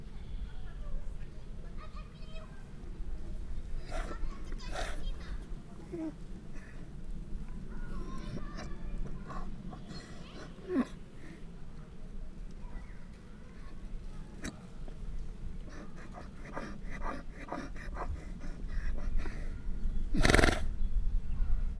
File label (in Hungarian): nyöszörgő állat egyet prüszköl, szamár; felsolajos zoo 2015; cardioid